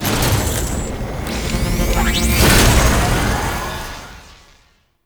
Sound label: Elevator Functional; World Seeder Descent